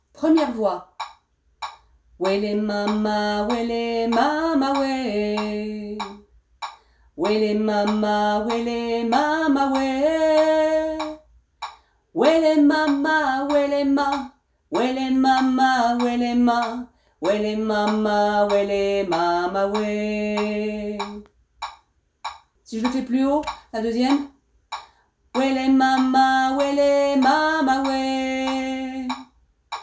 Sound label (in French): 2 voix